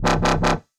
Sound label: failure